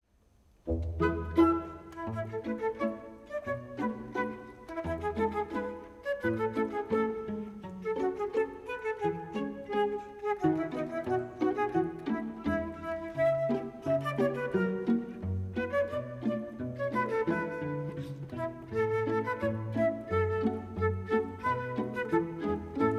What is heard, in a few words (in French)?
orchestre